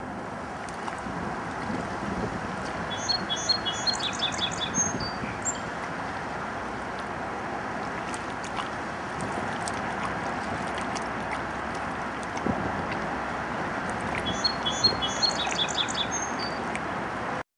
Song Sparrow; by river

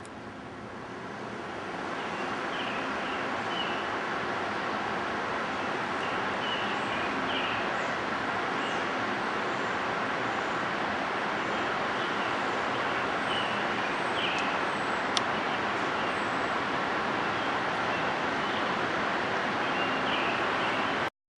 Rufus-sided Towhee

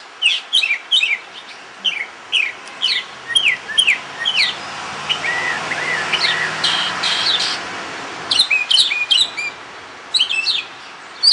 mockingbird Young sing

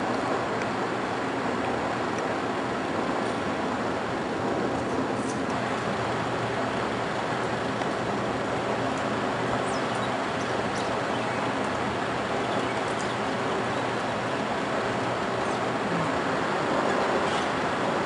catbird; at fine arts

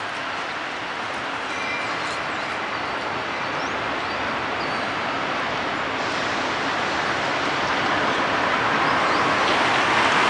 hawks

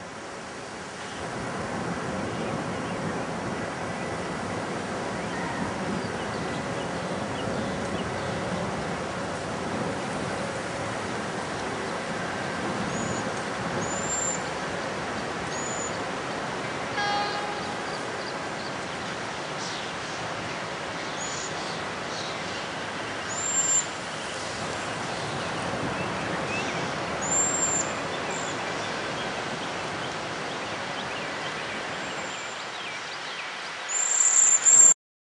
cedar waxwing

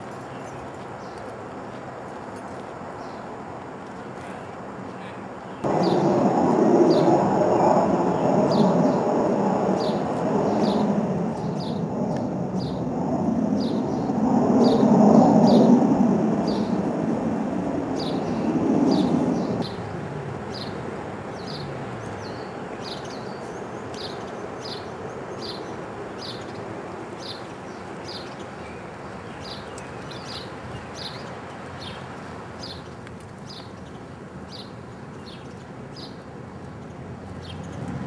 black&white warbler

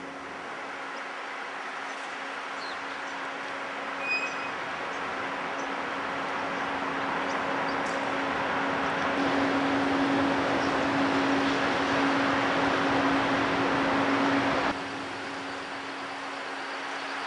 Hermit Thrush

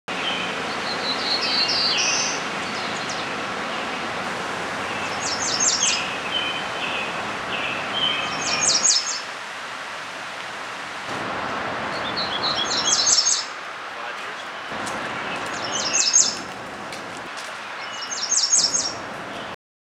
Black throated Blue Warbler

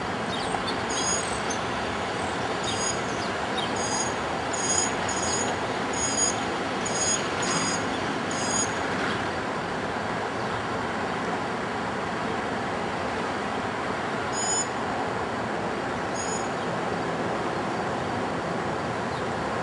mock babies

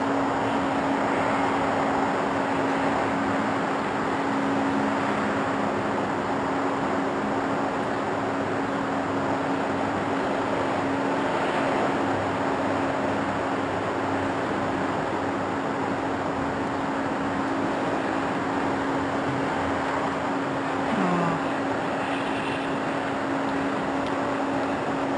swainsons thrush

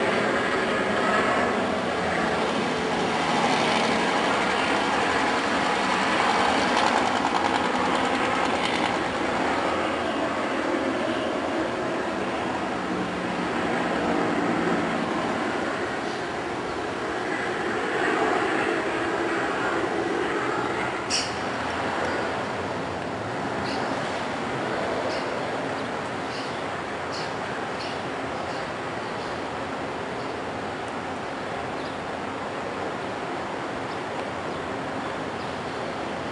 kingbird

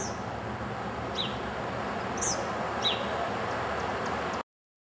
american redstart

